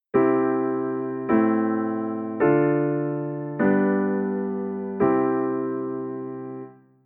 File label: Guided practice I vi ii V I